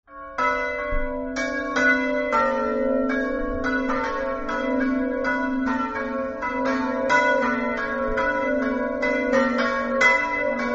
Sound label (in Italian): campane; muris